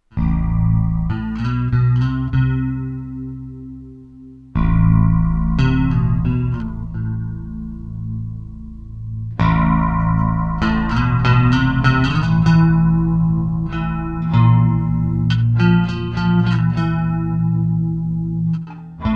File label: OCTAVE CHORUS, Zoom 506 II